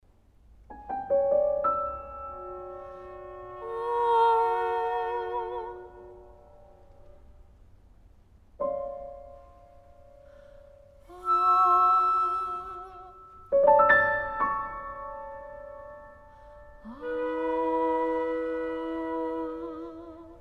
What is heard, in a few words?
voice flute piano